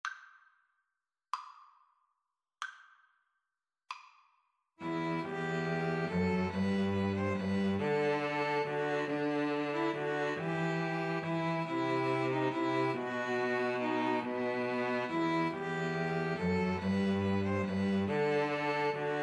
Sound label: what child, string trio